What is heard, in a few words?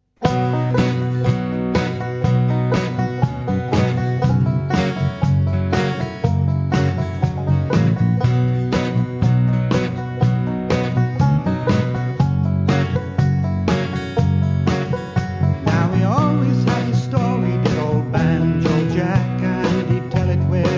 Banjo